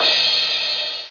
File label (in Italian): cymbal